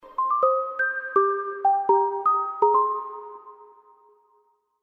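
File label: symphony notification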